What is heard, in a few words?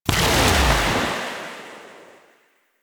Rifle Shot Alt; Weapons; Warframe; Thanotech